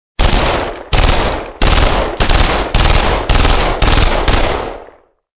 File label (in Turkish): 9mm parabellum silah sesi